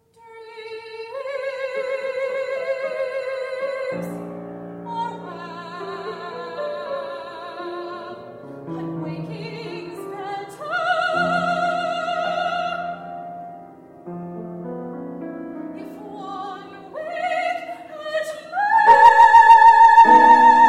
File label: piano